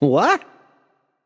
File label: happy; smite; voicepack; osiris